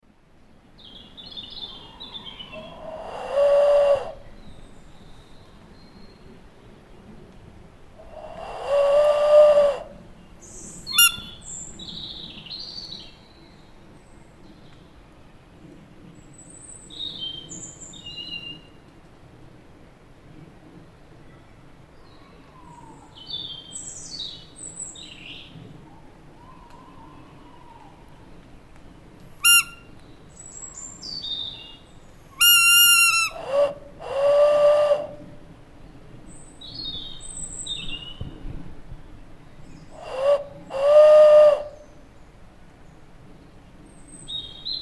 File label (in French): oiseaux; Oeuvre sonore où l'homme tente de communiquer avec les oiseaux; Série ACOUSNESIE